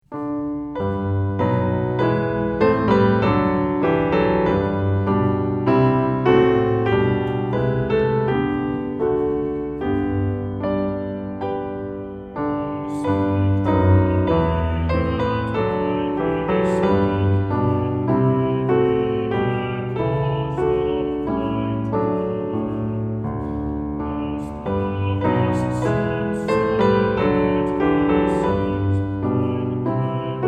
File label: Piano; Lord Speak To Me; piano accompaniment pieces